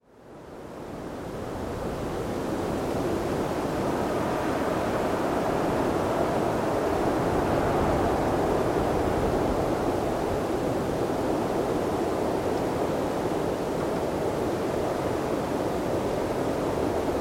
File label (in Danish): storm og stærke vindstoed; VEJR